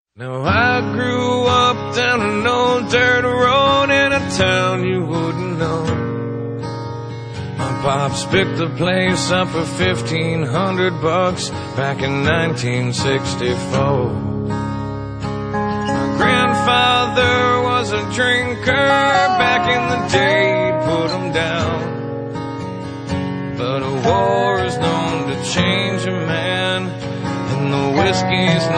Country boy

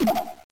Yawn Spit Up

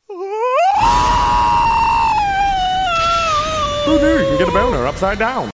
SCREECH; Speedwagon

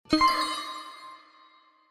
Anime note Sparkle

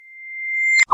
Messenger Reverse; FB

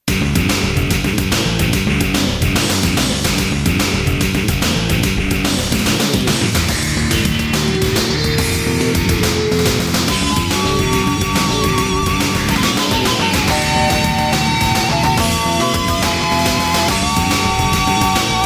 Jazz Tune

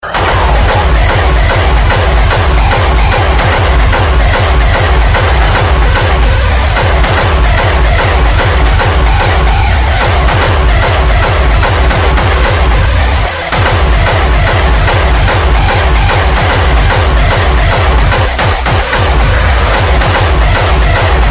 Hardstyle

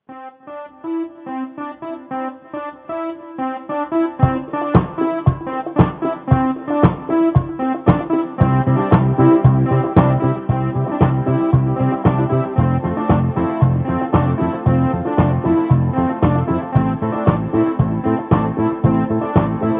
Elevator Muzak

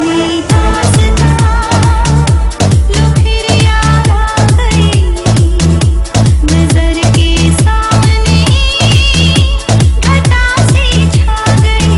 Horrer Movie Song

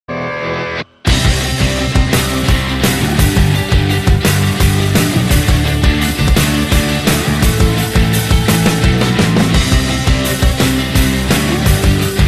Drums Guitar

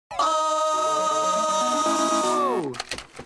"Ooooh"

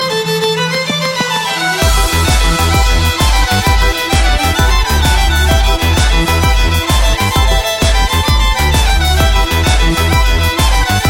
Cape Breton Step

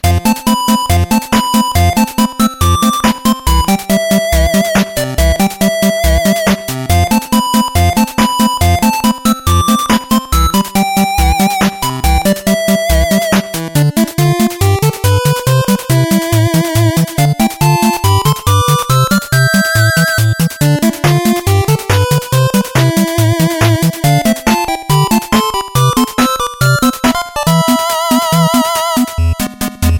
8Bit

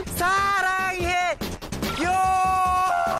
yelling

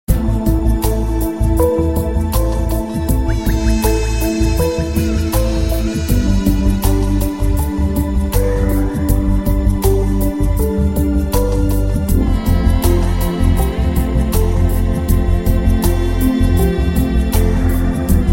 Romantic

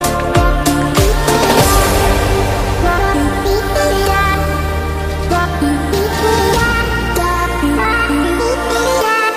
iPhone Beat Ringtone

